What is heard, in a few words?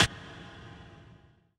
trip hop snare; Trance; Drums; Snares; Trance Explosion Vol1